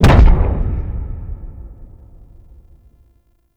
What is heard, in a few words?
Far Step Default; Warframe; Corpus; Arachnoid Hunger; Steps